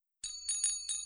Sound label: morse